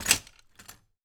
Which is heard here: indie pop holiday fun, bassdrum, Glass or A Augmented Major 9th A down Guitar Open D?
Glass